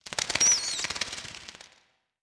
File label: bat; World of Tanks Blitz; hangar halloween 2019